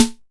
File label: snare, Korg ER-1; Kit 18